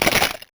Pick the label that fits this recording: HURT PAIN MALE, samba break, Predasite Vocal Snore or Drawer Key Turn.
Drawer Key Turn